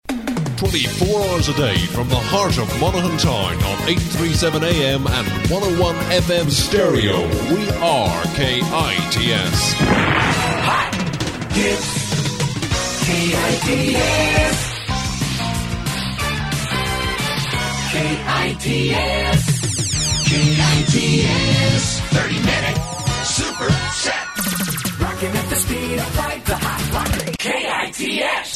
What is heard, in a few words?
JINGLE MIX